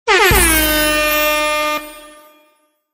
Achivement

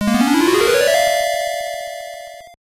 Potion; prince of persia; game gear

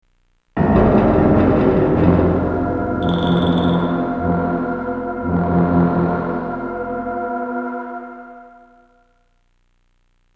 volcano intro; Indiana Jones and the Infernal Machine

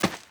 run grass

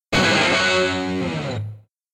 Attack Jingle; Dillon's Rolling Western; Nintendo 3DS

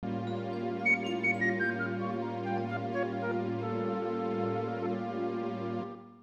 Birdman - Crash

